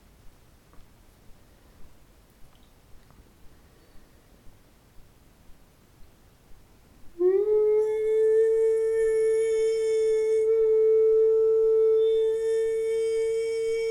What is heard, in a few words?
Chakra tune Sahasrara